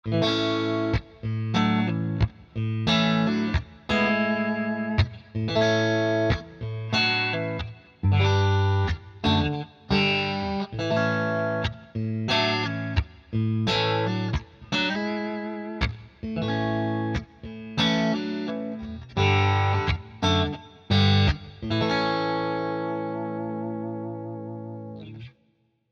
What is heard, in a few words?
Clean Amp; Archetype Petrucci